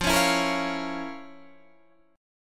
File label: F Major 7th Suspended 4th Sharp 5th Guitar Standard, chord; FM7sus4#5 strummed; JGuitar